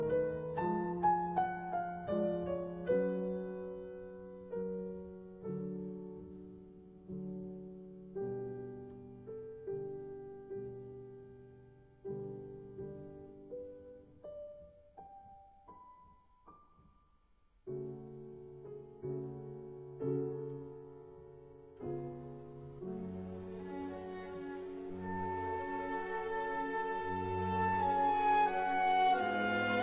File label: Piano Concerto in A major, Adagio; Piano Concerto No 23